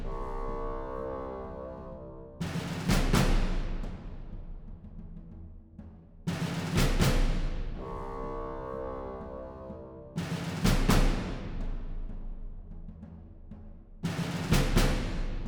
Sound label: military near-loop